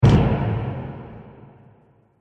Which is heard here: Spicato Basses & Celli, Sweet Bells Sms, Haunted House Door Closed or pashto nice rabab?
Haunted House Door Closed